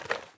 pickup; Escape from Tarkov

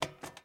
rocket reload turn start, tanks; War Thunder

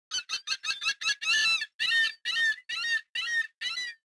seagull; World of Tanks Blitz; ambient milbase

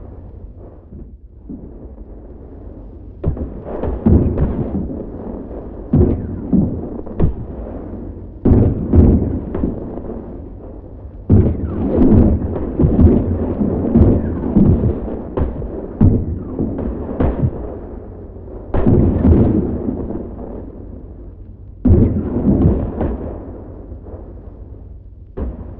Amb Destruction; Red Orchestra 41-45

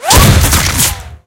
tank hit he small; tanks; War Thunder

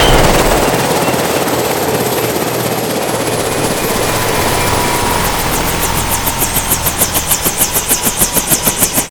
heli crash; Call of Duty Modern Warfare

